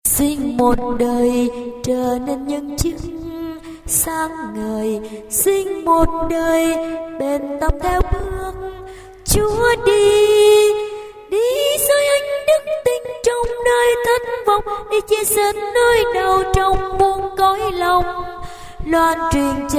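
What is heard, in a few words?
soprano tenor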